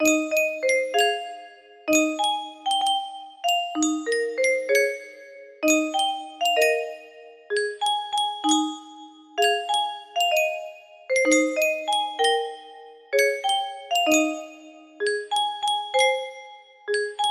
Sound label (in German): Spieluhr, music box melody